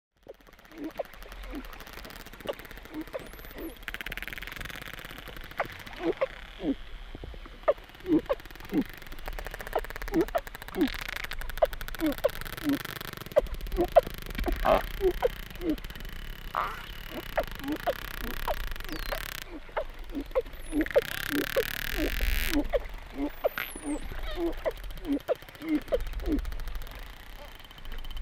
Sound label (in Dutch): Tuimelaar